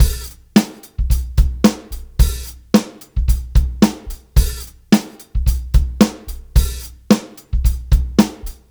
Live Beats, R&B Drums

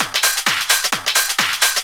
drum 130, techno trance drumloops; Techno Trance Essentials

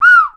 bird calls, percussion; Voice Crystal - Steve Reid Definitive Percussion

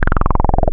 Filter FM, wavetables; Animoog

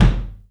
ROOM KICK; 3D Drums

